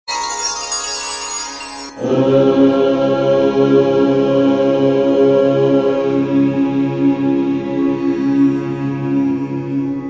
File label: Lakshmi Mantra